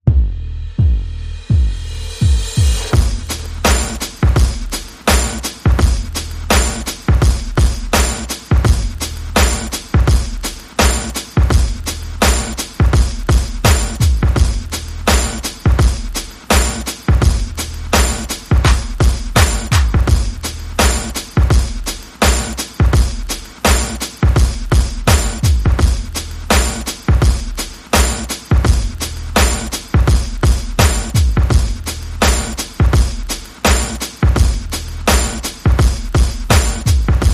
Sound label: Under The Hood, Perc and FX Only